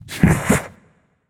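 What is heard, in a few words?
"heh heh", nonverbal; smite; khepri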